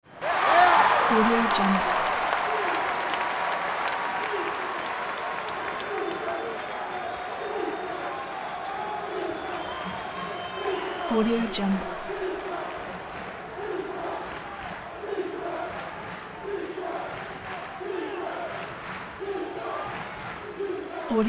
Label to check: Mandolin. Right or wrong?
Wrong